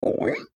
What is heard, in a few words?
pig question